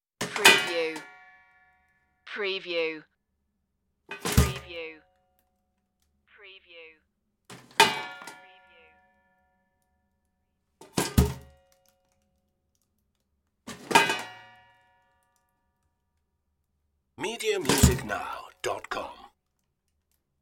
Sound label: metal trash bin